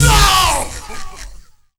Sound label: Punched Oh, Vox FX; Total Drum&Bass